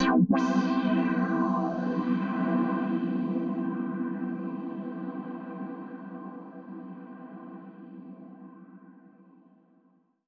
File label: TECHNO EXTRA 140